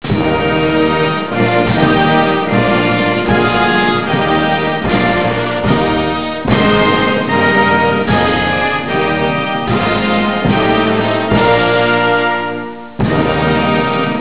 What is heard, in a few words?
German Anthem